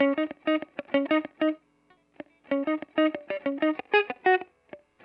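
095 Chill Pop Single Note Guitar Eb minor, Chill Electric Guitar; Pop Rock; Pro Tools Essential Loops; Digidesign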